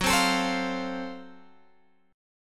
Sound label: F sharp Minor Major 7th Flat 5th F sharp down Guitar Open G